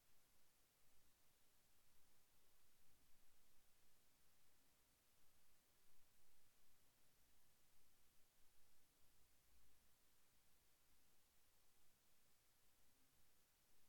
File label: silence